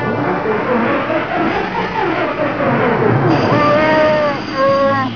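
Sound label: hyperdrive Failing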